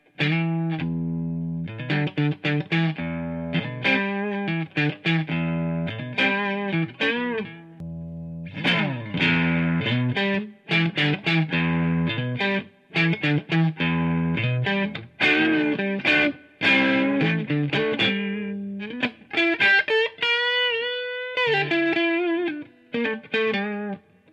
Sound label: Noodling, Distortion